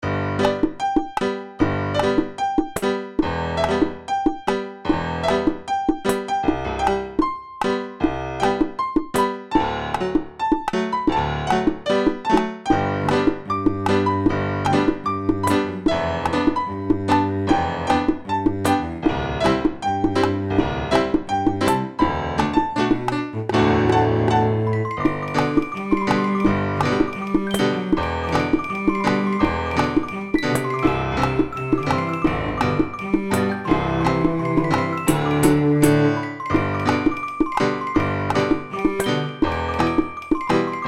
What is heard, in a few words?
accompany